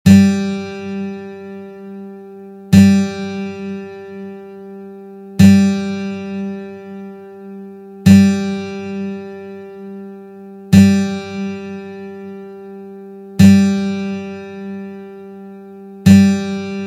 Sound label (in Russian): struna Zvuki strun akusticheskoj gitary